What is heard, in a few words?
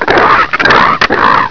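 alien grunt alert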